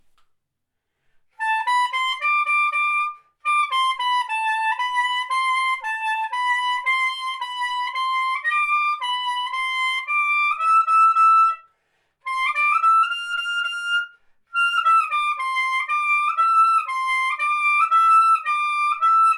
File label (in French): rien de grave dans les aigus, quatuor